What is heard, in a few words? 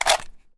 mag out, weapons mini14; Urban-Coalition; Decay; arccw ud